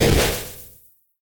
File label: attack